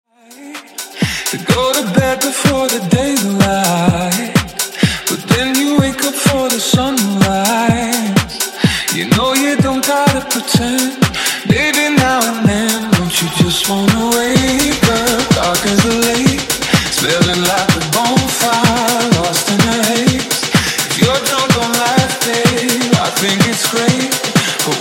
Funk Bootleg 126 Bpm

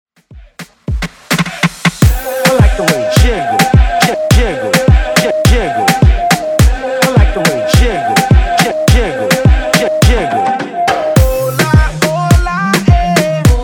Top40 Reggaeton 105bpm

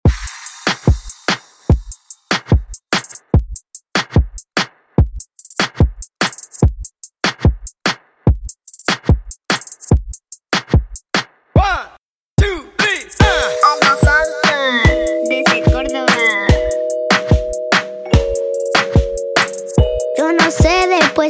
Moombah 145Bpm

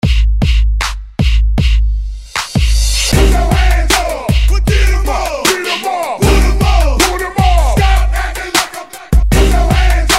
Hype Redrum 77 Bpm; DJDOLLS

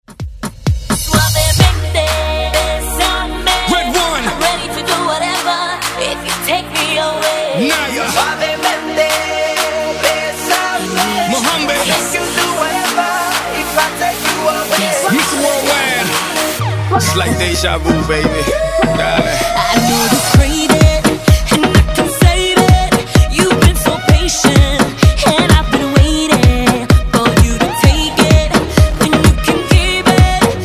Afrobeat Redrum

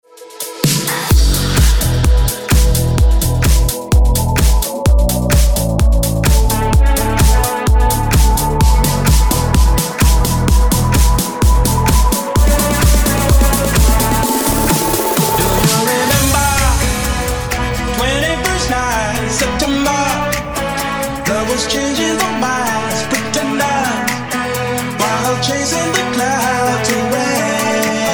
edm breaker 128 bpm

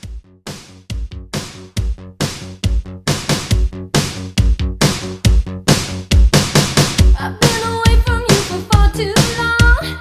80s Pop Re Drum 138

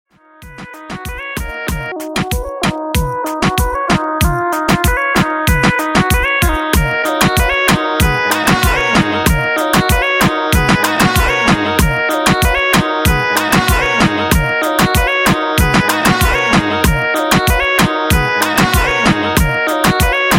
RB dancehall 95 bpm

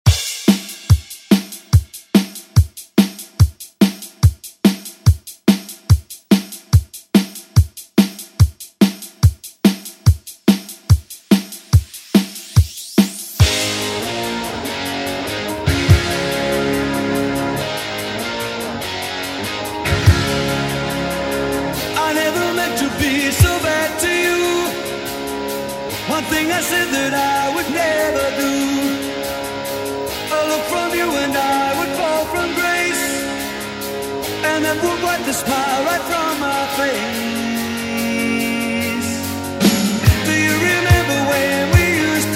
80s Re Drum; DJ Mhark

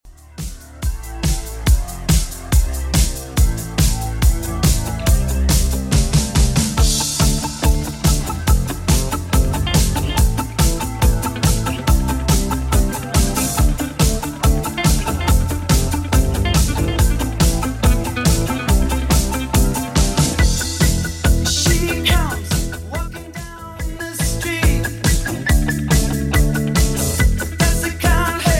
80s Redrum 141bpm

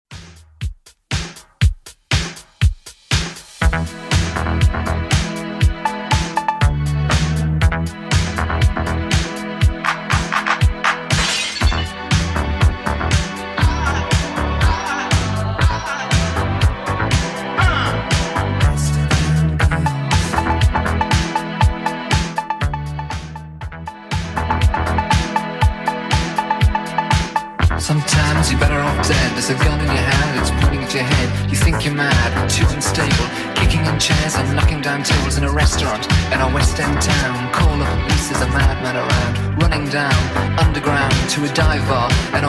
80s Redrum 120bpm

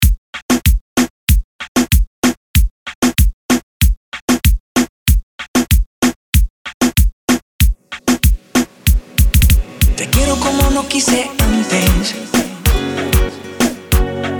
Reggaeton 95 Bpm

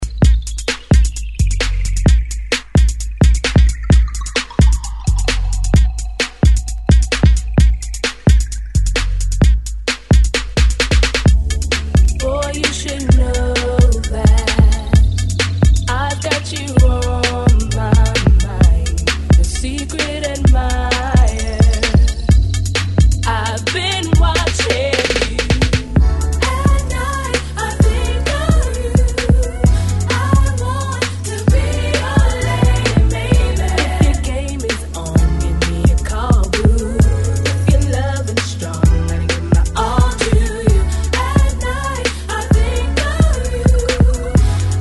90s Intro Outro 130 Bpm

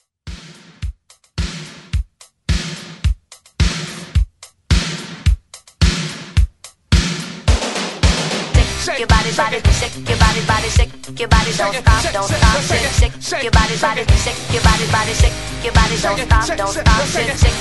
80s Hype Re Drum 108Bpm; DJDOLLS

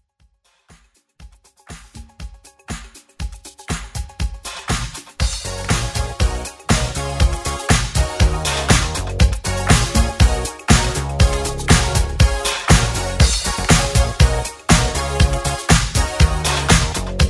80s Dance Redrum

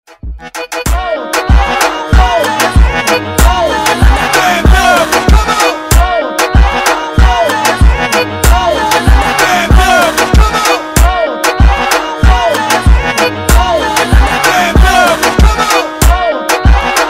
Hype Reggaeton Redrum

